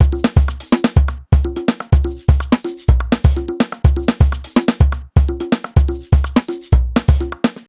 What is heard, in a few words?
top full, 125 Beats